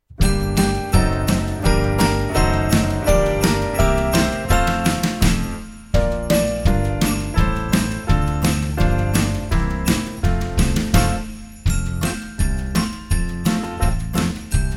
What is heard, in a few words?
Accompaniment Only